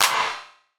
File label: Clap, Gated Reverb; musicradar